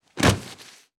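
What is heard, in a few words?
Heavy Floor Body Fall; Fairlight Sound Lib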